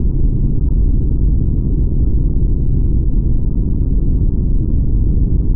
Fire; Cruelty Squad

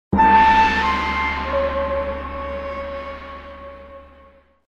Lose Sting

